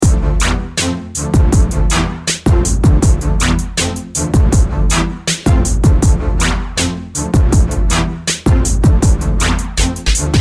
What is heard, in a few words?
groove